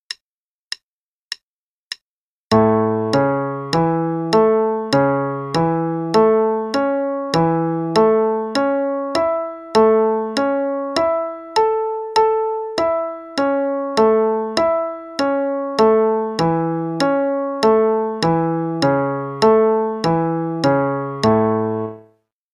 Broken Triads to the Octave only (A Major, qn=100); root position / and inversions, / up first, then down; CM Level 4; Daily Warm-ups